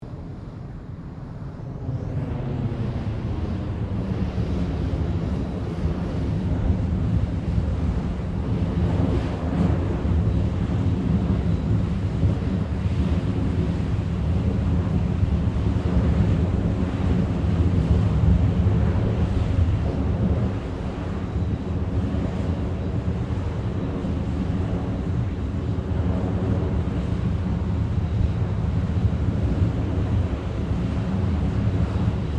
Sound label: REFINERY GAS BURNOFF FLAME; Machinery; SoundEffects-Foundation4